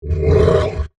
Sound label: Monster Growl, Horror, Monster, Growl, Creature; Monsters (Sound Effects:Horror)